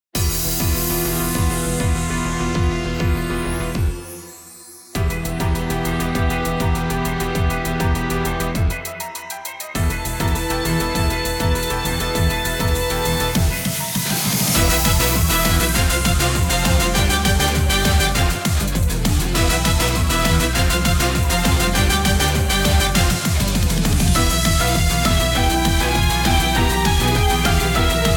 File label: Battle; Pokemon Masters EX